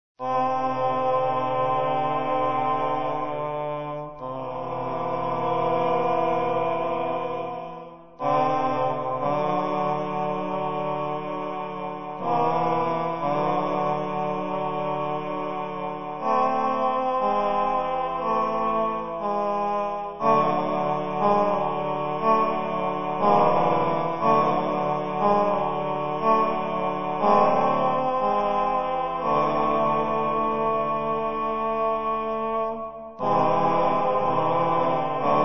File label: choir of 7ado